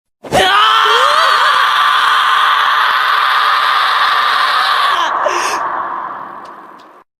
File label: scream; isagi